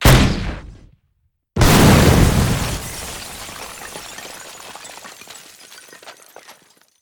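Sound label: Normal explosion sound explosion sound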